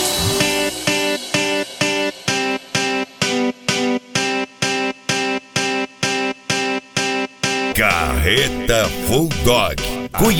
flashback

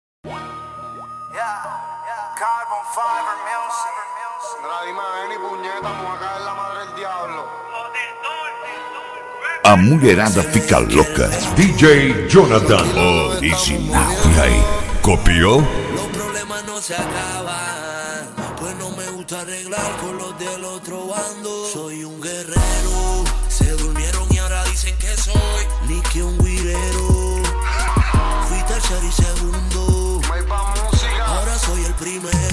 reggaeton mix; reggaeton mix evolution

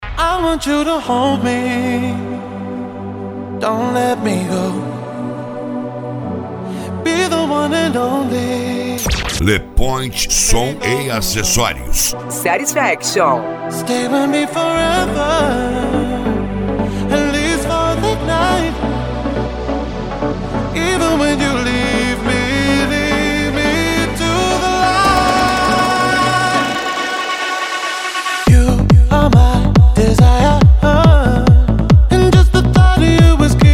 deep house

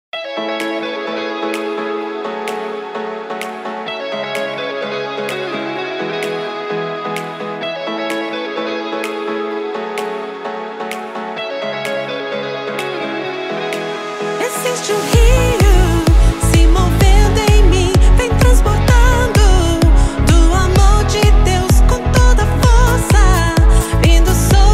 remix gospel

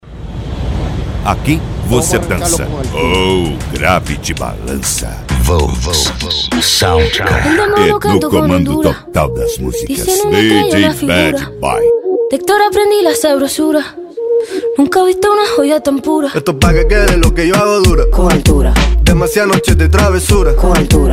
sound car reggaeton; cd volks sound car

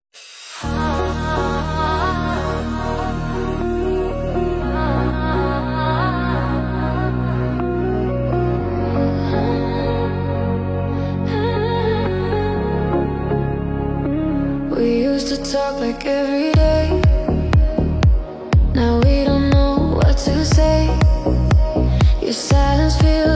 house

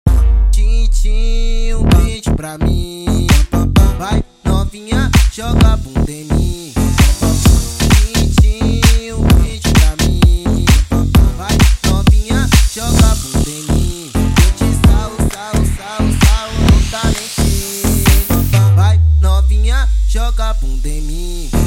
funk in beat

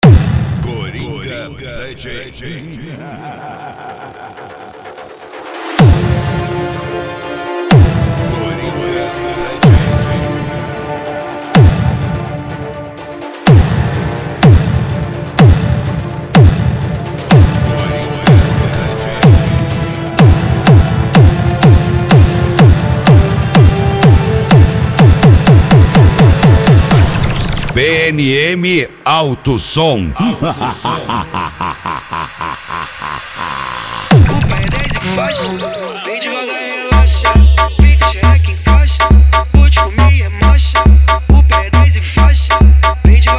funk

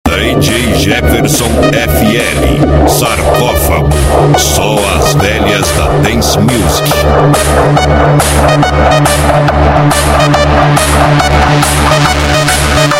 dance musik

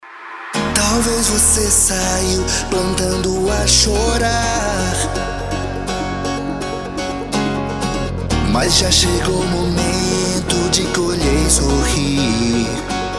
remix gospel

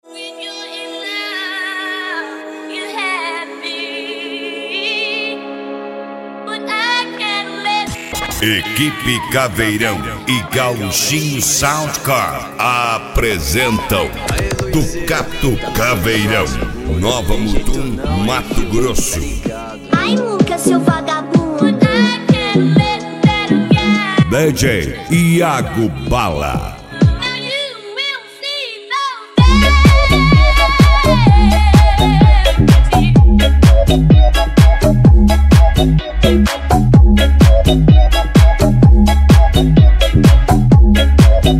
especial mega funk

